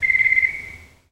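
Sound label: Crickets Short Single Chirp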